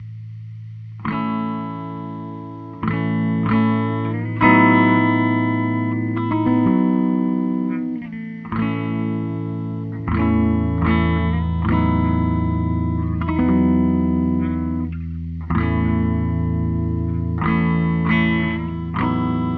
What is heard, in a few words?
Very chill guitar; nature theme; Hylics